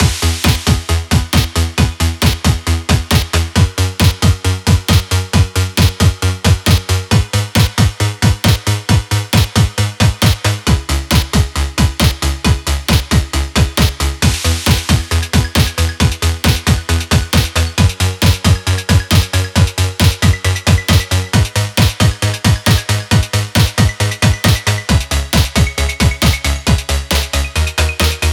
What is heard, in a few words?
Chiptune; Chiptune Music Pack Vol. 4